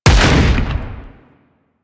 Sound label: Sniper Rifle Shot; Grineer; Gorg